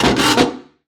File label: Deployment Patrol Assign; Prison Architect